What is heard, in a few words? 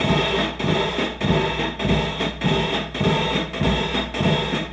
Loop, rhythmate, classic machines